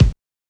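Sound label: BASS DRUM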